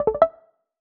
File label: General Pause